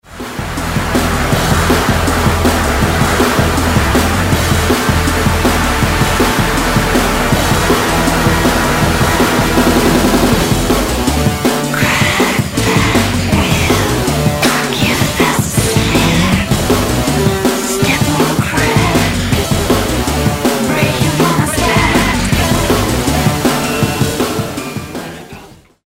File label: distort